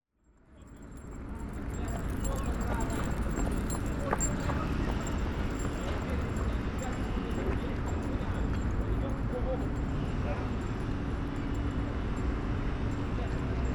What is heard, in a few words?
Regents Canal Wennington Green